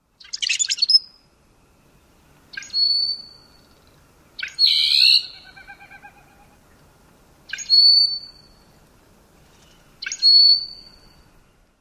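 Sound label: RUSBLA songs, rusty blackbird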